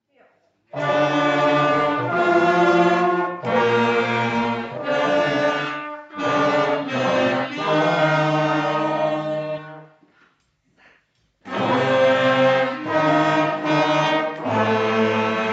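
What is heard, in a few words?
Bläser Jingle Bells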